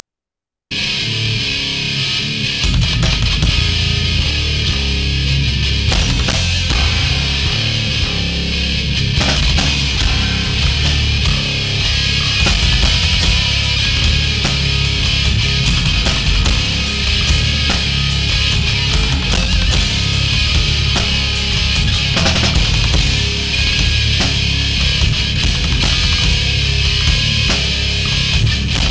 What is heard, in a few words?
trance